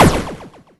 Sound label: dunk, basketball; Nickelodeon Basketball Stars 3; ThrillGames